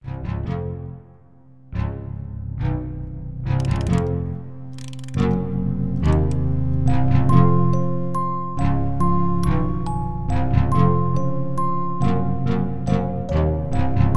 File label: Manic Musicbox